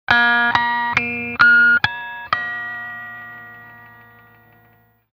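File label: electric guitar harm whistle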